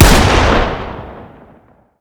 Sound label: fire; gmod; arccw uc commons attachments; ub12ga